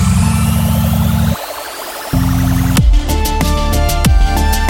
skimming the atmosphere

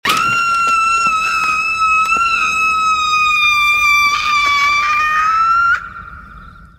scream woman

